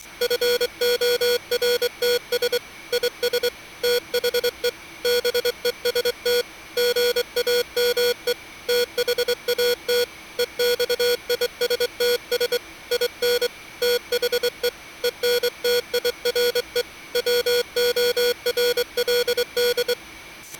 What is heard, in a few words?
Morse Code